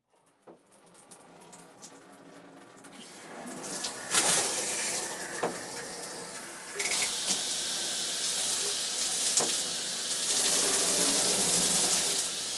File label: shower; fvtt sonniss gdc audio